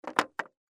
skateboard kick

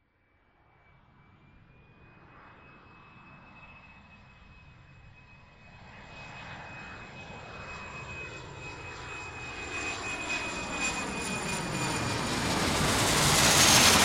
Jet Flyover

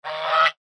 goose honk

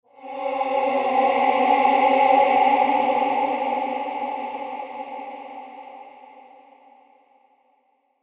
musical haunting

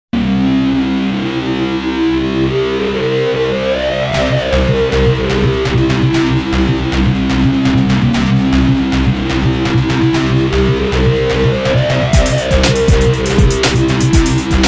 Techno Freako; Soundzabound Volume 3

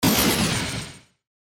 Burst Digital

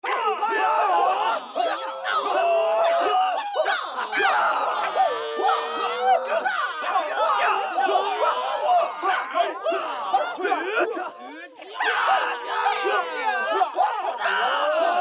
kung fu

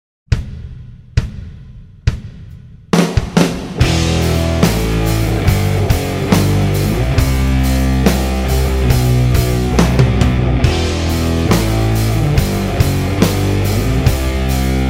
70's Goth Rock; Soundzabound Volume 5 Disc 3

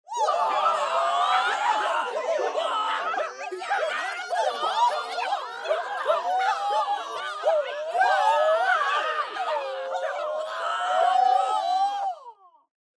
kung fu

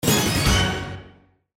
Radio Musical

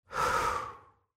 Exhale

